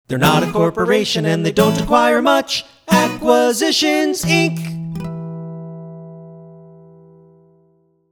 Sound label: jingle; Acquisitions Incorporated